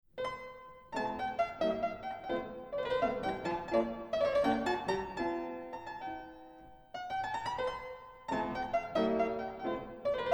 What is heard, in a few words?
Mozart pianoforte Sonata KV 497 III Allegro